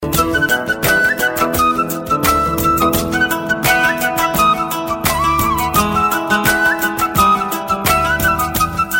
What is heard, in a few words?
Flute